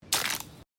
splat

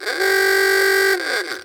hit Player; nerf-ultimate-party

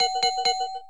shot warning; cheesy-magic-quest; dragonFirefighter

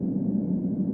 asteroid loop; chambersOfDoom

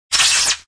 convert; nerf; rampage

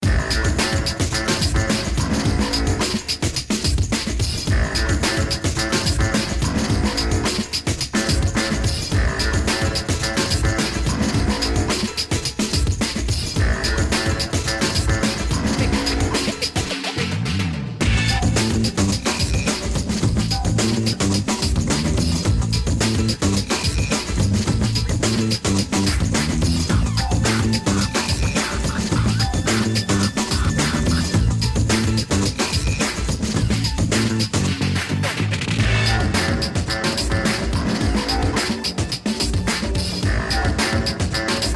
music; nbaspotlight

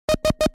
zone hit; nerf; rampage

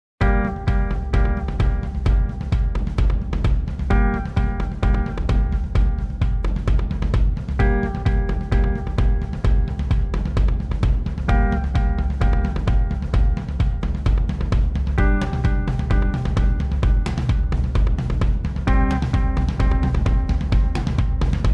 music; cheesy-world-of-adventure; parachute

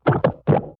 death; lego-city-sea

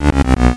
game over; cheesy african; hippo Basketball

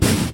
move Tile; myLittlePony

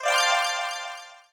collect Pack; cheesy-magic-quest; dragonFirefighter

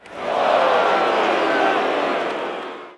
aww; cheesy-sports; quarterback

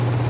fan; cheesy-world-of-adventure; parachute

